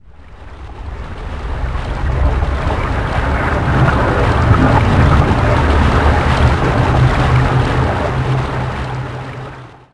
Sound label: water fill